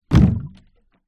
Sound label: industrial cannister small plastic liquid down hard ground; zapsplat